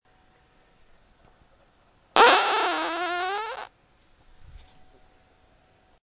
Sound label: fart